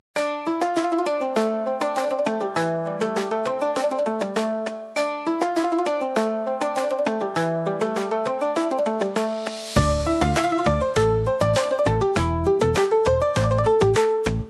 Asian Rhythms

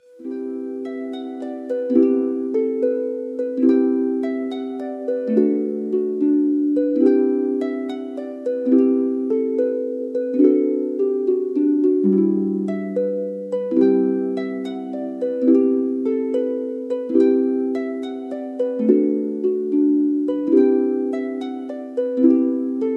celtic harp

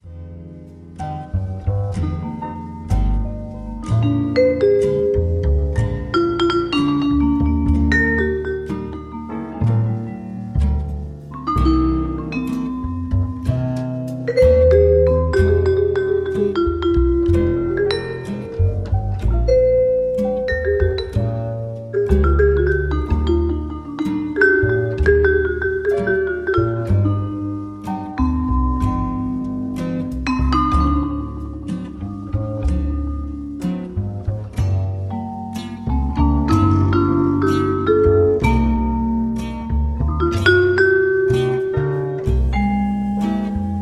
jazzy improvisations

